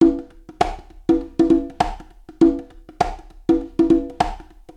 conga funk 100, drum loops

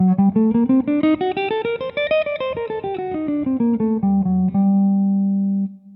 G harmo, scales

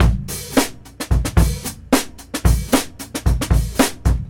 Drum Loops, Funk

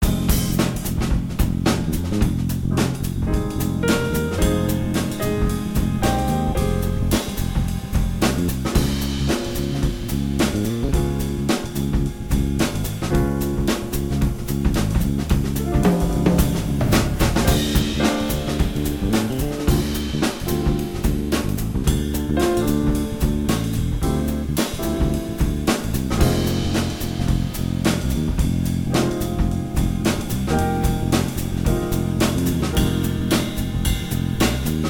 cantaloupe funk, Guitare

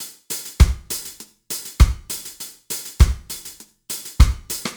REGGAE, 100, Drum Loops

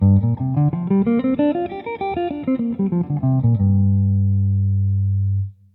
G penta; scales

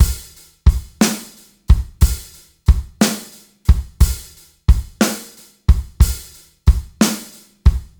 blues 60, drum loops

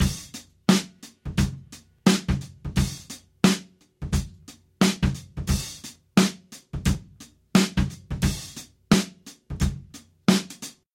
Funk, Drum Loops

